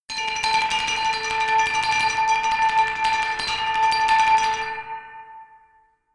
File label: glockenspiel dark tremolo low octave